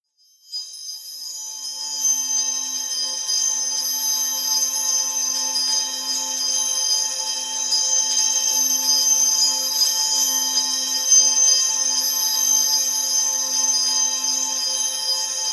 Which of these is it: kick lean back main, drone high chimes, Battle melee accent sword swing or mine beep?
drone high chimes